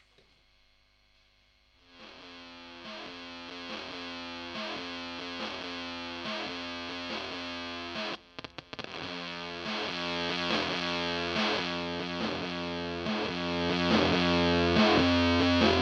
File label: drum beat; superweirdo